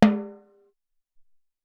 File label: Tm Bal